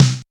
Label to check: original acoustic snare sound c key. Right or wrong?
Right